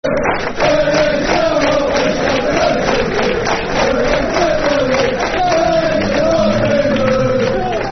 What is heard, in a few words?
Football Chants (Soccer Songs); We Shall Not Be Moved; Everton Efc